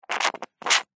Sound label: foley liquid squirt foamy dribble short